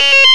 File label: end turn